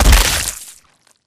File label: railing smash impact; Titanfall 2